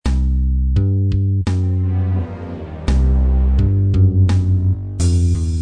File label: Nasty two bar groove